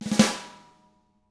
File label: snare ruff; ILIO; Double Platinum Drums 1; SNARE ROLLS